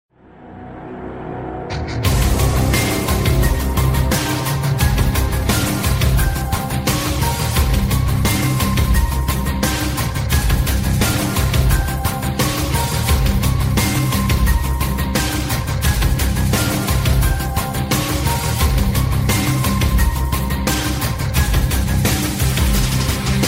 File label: evil progressive ringtone